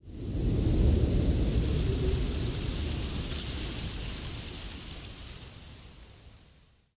wind, outdoor ambient

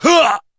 pain

